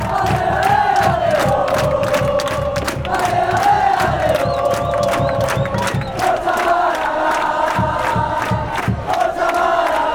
ale ale ale oh forza malaga, fanchants

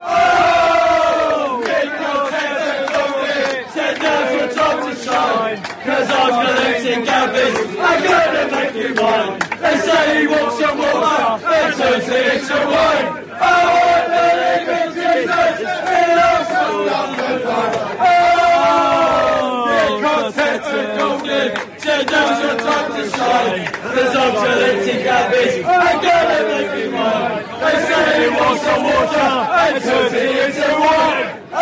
player chant, fan chants; gabriel jesus; united kingdom

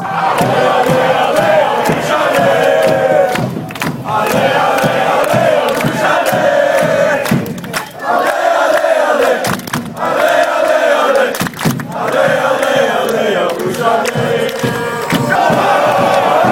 allez les rouges allez, chants

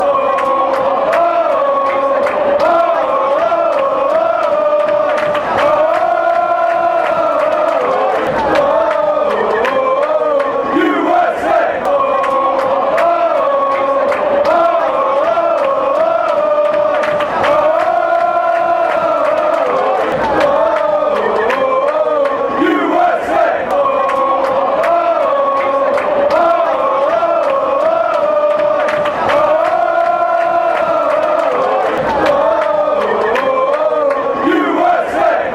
woah usa, fan chants

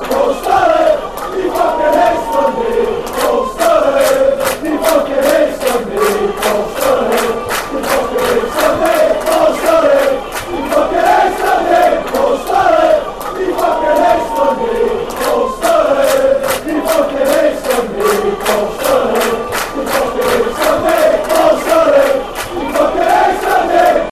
hates dundee fanchants; paul sturrock

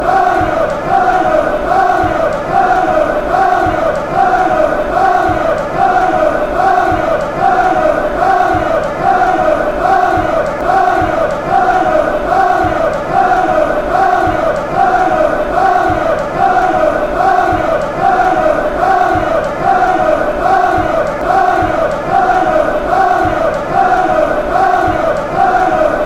az chant

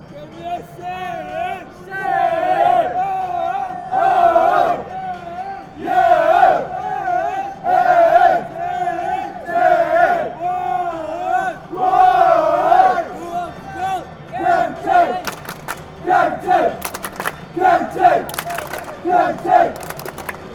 chants; spell it county ncafc

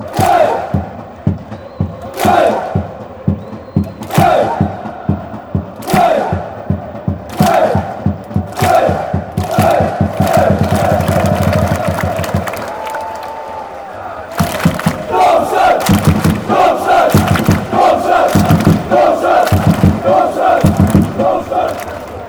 hey fan chants; domstadt

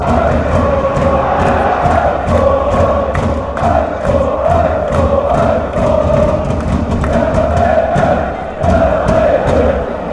a goal, fan chants

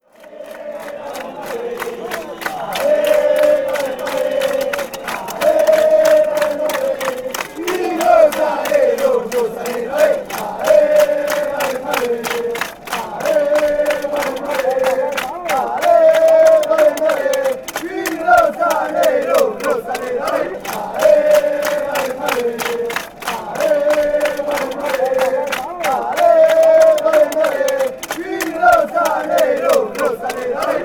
ale ale, fan chants; palermo

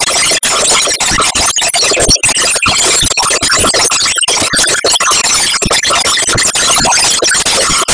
aris chant

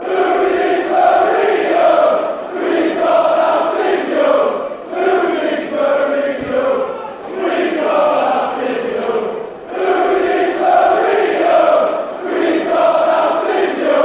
who needs, fan chants; mourihno; sufc

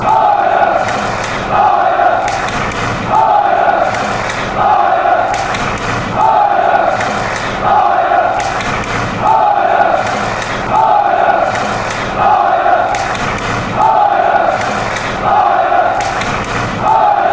clapping, chants; ajax

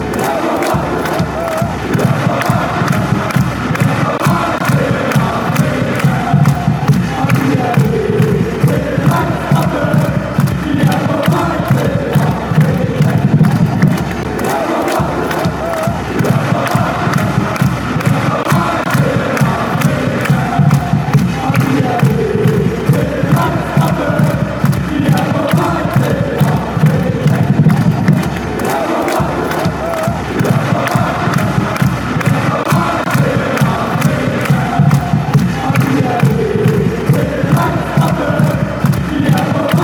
denmark chant

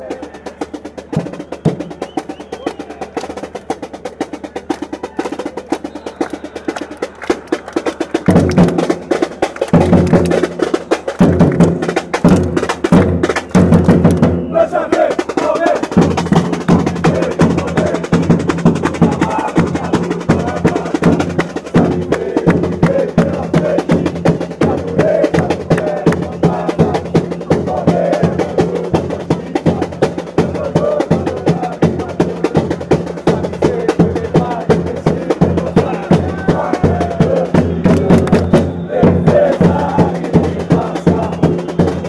chant, fanchants; palmeiras

